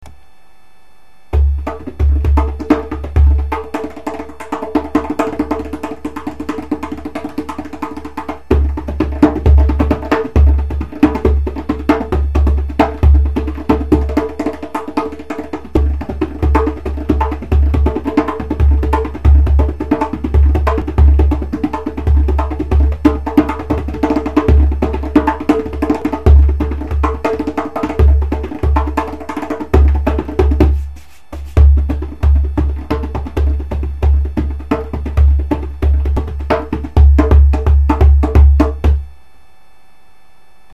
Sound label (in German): trommel